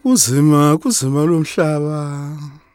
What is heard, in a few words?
Vocal Chirp, African Voices